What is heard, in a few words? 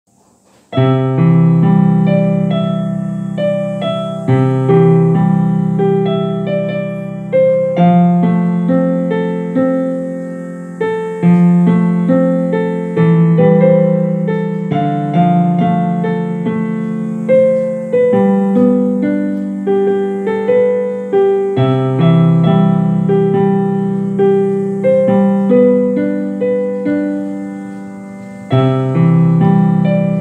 piano